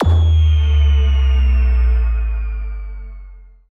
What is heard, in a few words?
Game Over Sound; Equalizer